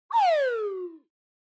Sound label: Slide Whistle Mid Down